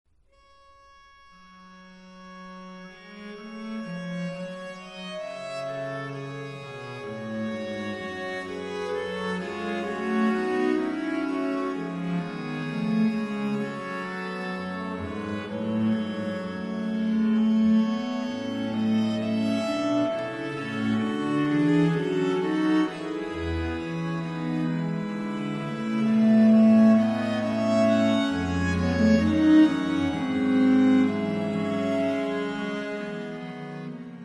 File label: Playnsong in G; lawes